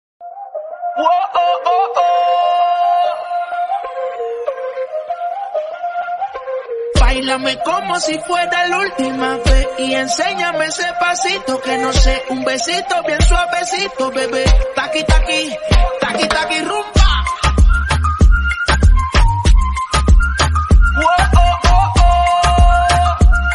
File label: rumba; ringtone